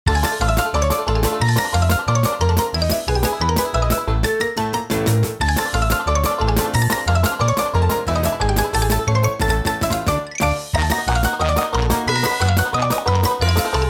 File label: Chase